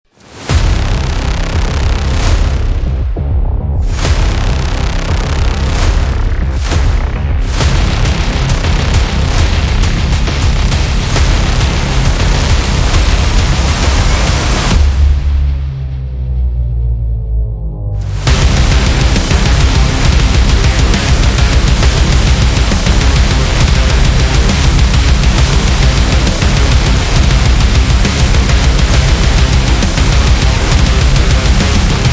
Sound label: Wrestling, electro rock